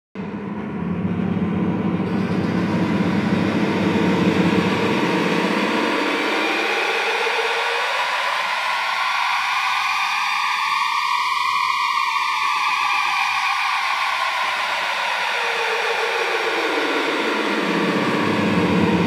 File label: harmonic series from 8 with glissando